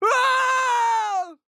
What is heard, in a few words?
Fern Death